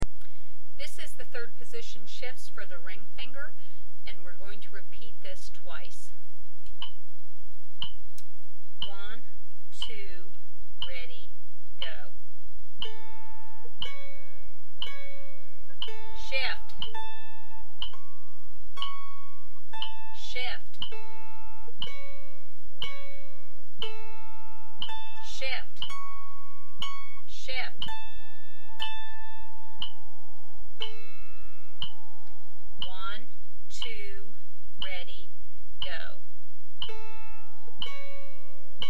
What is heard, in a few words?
Third Position Shifts Ring Finger, mandolin